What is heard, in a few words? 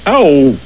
Owwww; Homer